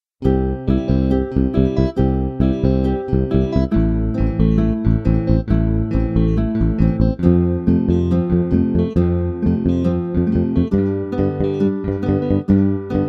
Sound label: guitar